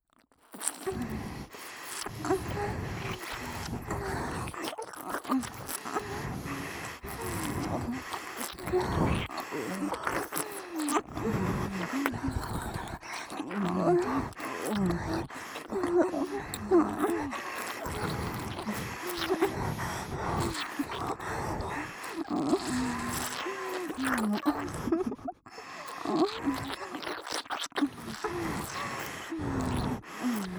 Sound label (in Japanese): ダブル鼻息耳舐め